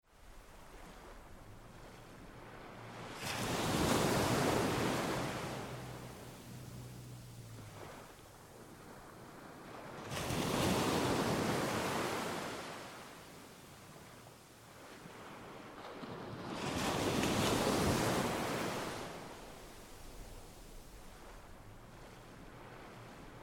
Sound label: wave; tyousi